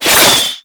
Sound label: Projectile Whiz By; Ultimate Abilities; Madurai; Warframe; Focus